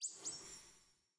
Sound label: celebrate turbo badge glint; tft turbo